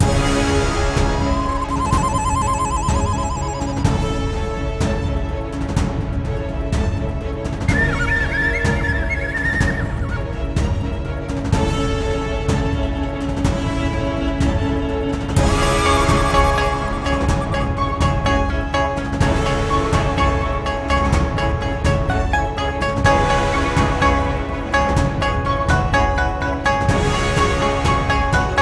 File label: bgm battle static; donghuang